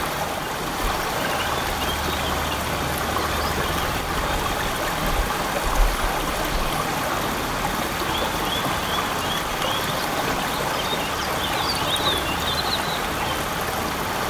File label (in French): Ruisseau; Landos